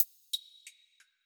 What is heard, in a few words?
tick